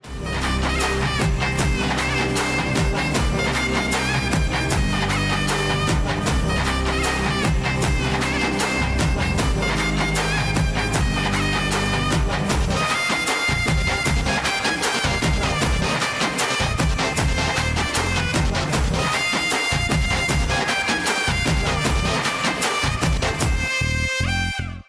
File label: Vijay BGM Music; Unga Vijay; tvk ringtones